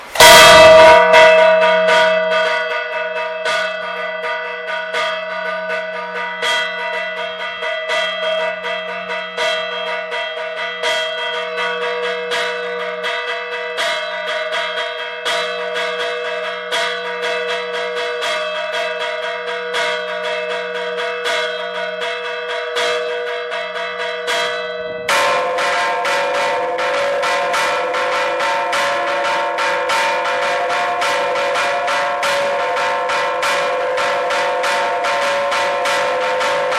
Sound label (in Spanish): celebración, campanas